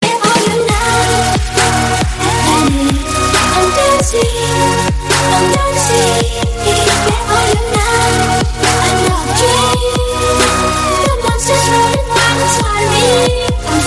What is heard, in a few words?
Remix Ringtone